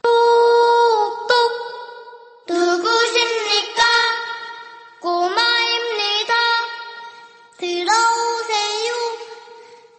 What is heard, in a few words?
jump rope song; squid game